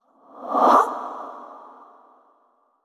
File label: surprised short; yamiko